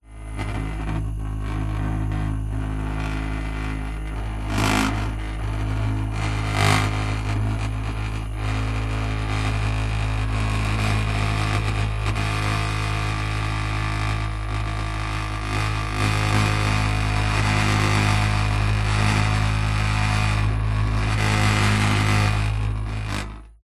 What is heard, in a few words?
foley metal vibrating metal surface; zapsplat